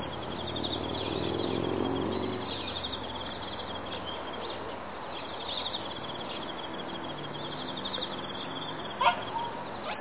kourogi koe

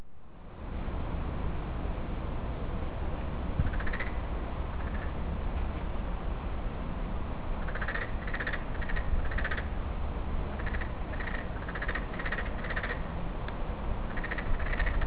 KAERU HACHIMANNUMA

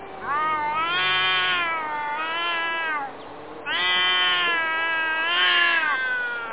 NEKO KENKA ONSEI

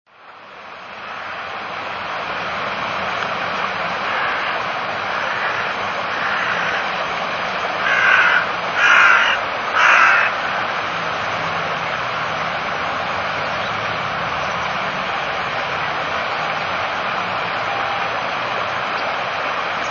HIOROSEGAWA SESERAGI

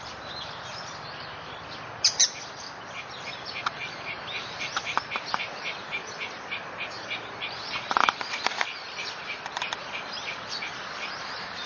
AMAGAERU MOZU HITONAKI

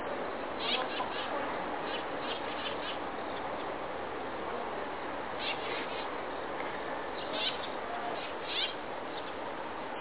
suzume yuugata

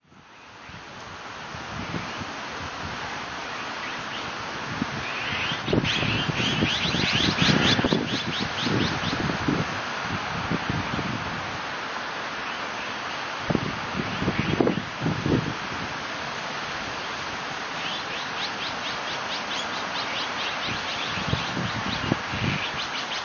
ONAGA NAKIGOE

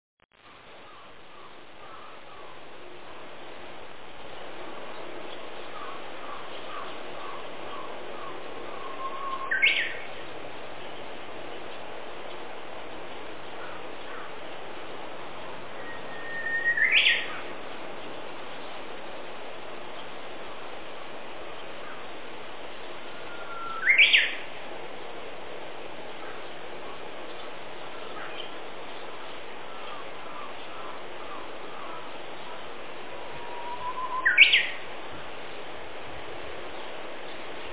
uguisu nakigoe

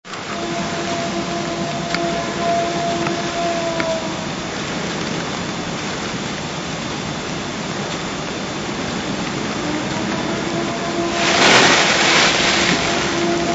SAIREN KAJI KYOUFUU

